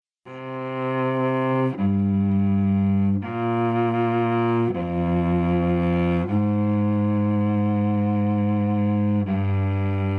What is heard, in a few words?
cello; Dark Fall II Lights Out